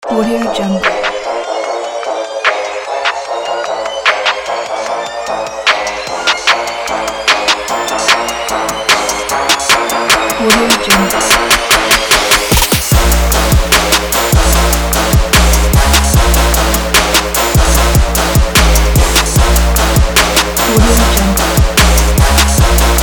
trap is trap

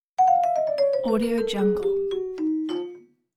descending slow down clavichord; hollywoodedge

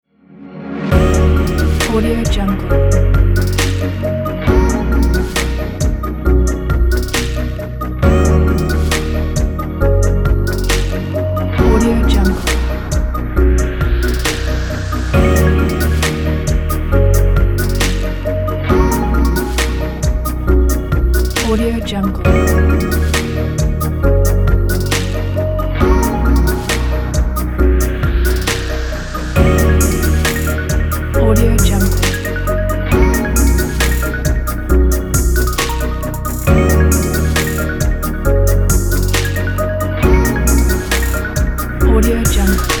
abstract inspiration

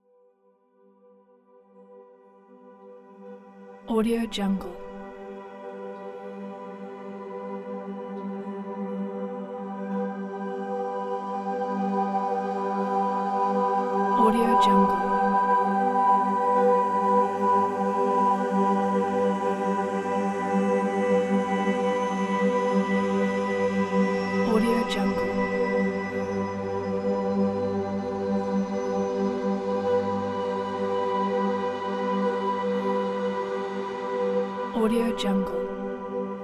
heavenly epic undertone

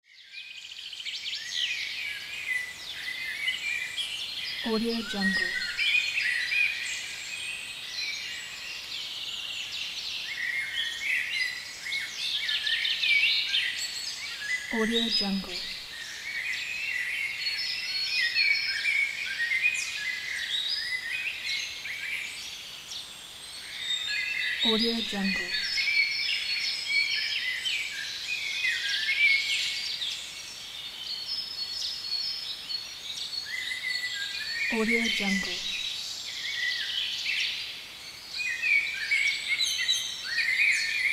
bird and great forest

